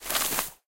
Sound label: thick forest step; Golden Crayon Sounds